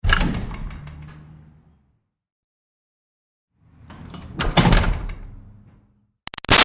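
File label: Door Metal Retail Push Interior Open-Close; Metal Doors (Sound Effects:Doors); Big Room Sound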